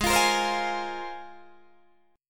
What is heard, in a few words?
Ab 6th Add 9th Ab, Guitar, Open D